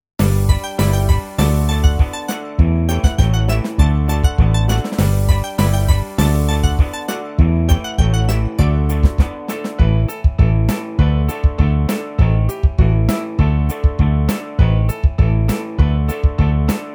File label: Karaoke